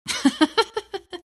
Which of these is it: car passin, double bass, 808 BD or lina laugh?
lina laugh